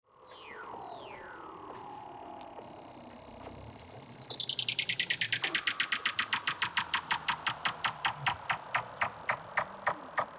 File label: Weddell Seal